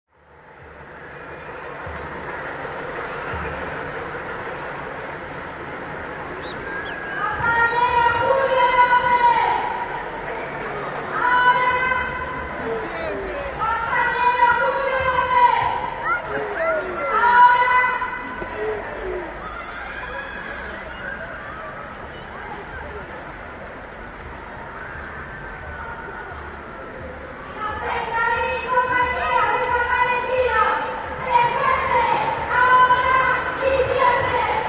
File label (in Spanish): actos aparicion con vida; j j lopez; m n a la bandera